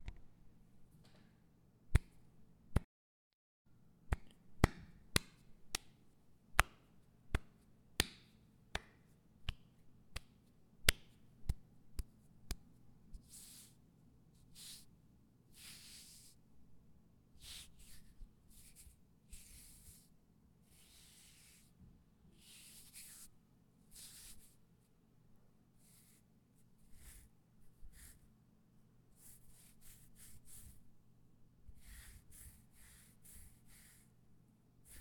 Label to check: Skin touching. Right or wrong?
Right